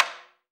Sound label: AFRO.TAMB, AFRO.TAMBORA